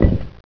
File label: brik hit; ParoxysmII; ambience